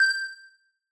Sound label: beep